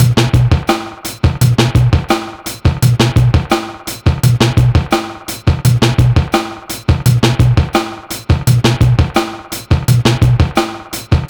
85 bpm; THERE U R; 90 s Sample CDs; Planet of the Breaks

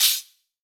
HH, Drum Mashines; HIHATS 2; EdgeSounds

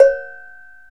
TUNED C, Cowbells; Roland L-CD701; PRC Latin 1

